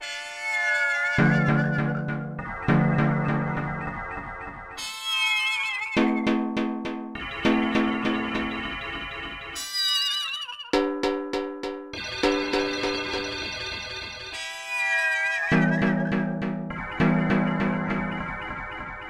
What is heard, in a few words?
Cartoon